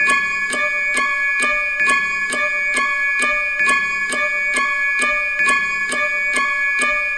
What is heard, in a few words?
tictock